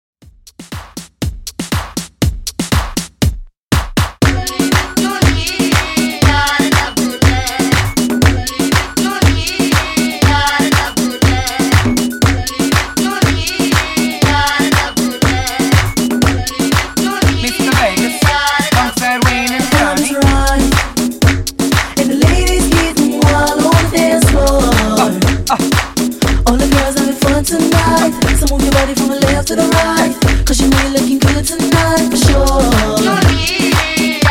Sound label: DanceHall ReDrum 120Bpm